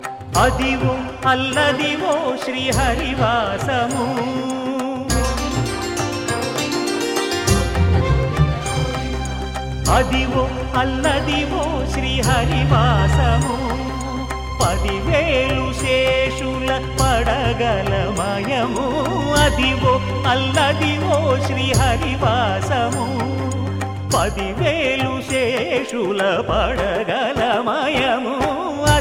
devotional; Venkatesa

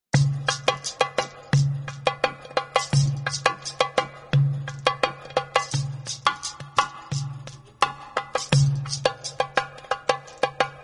simple drumming

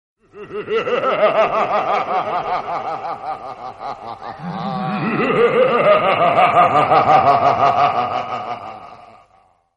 spooky laughter; Comedy

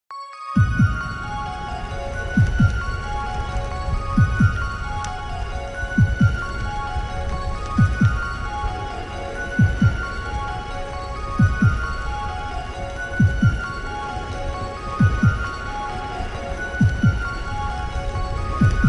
Angel Heartbeat

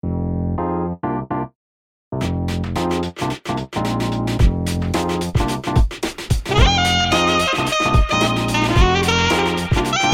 jazzy message alert

